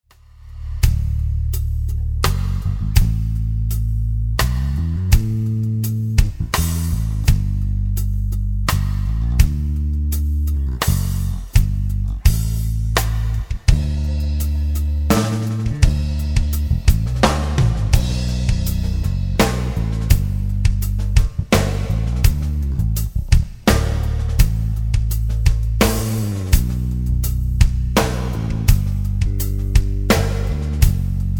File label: rhythm